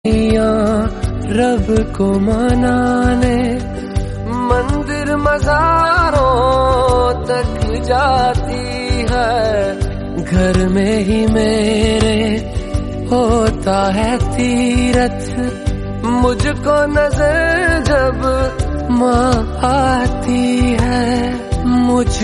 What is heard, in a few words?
Maa Hindi Ringtone